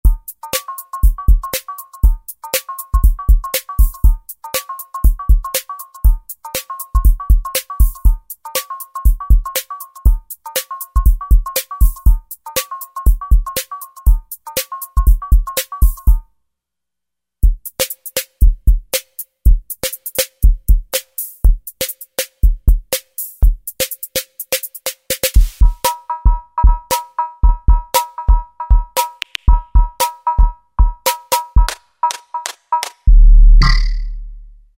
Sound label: Rhythm Ace KIT, Roland V-Synth; Factory Patches